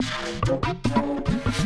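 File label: Music Dpad Base 145; From Dust; PS3; Xbox 360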